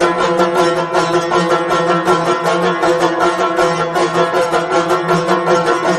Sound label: banjo; music